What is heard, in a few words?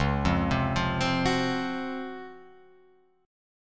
Db-Major 11th, Guitar, Open F; arpeggiated; JGuitar